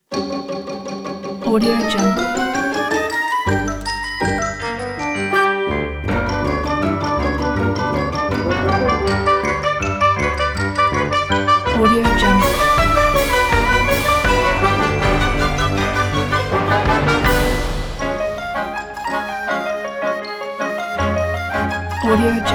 funny puppets playing

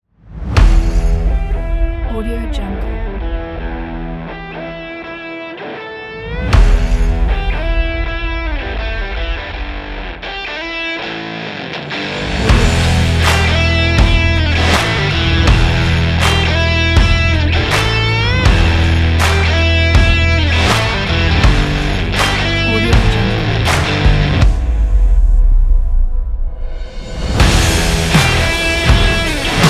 stylish fashion rock

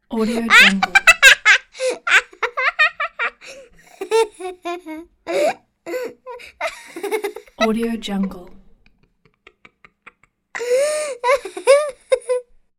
kid laughing

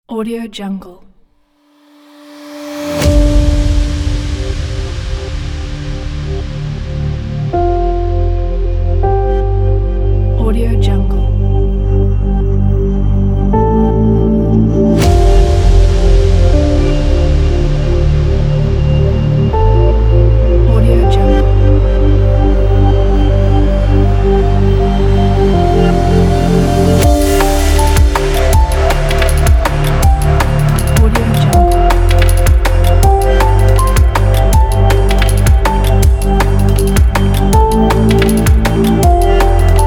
abstract drum bass

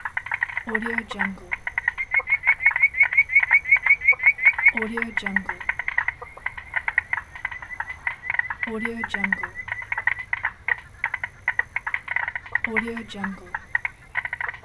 toads in the swamp

